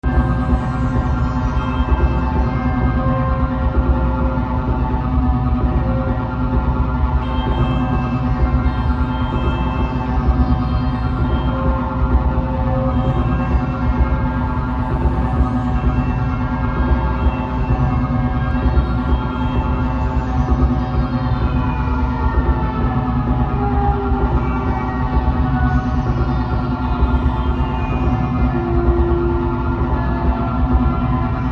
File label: sawtooth